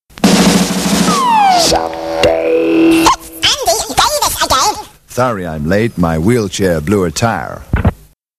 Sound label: Jingles